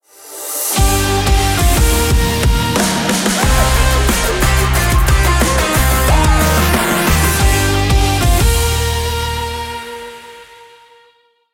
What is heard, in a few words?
SPEED JINGLE; RADIO CHABLAIS